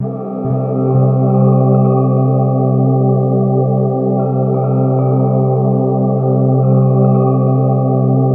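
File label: soundtrack, ambient atmospheres; Ethereal Atmosphere; USB Soundscan vol.13; AKAI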